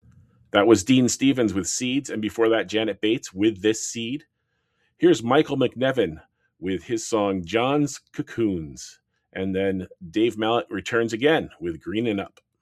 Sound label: Break